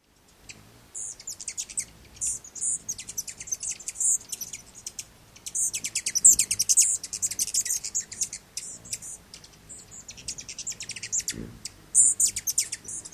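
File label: chips & trills, Rufous Hummingbird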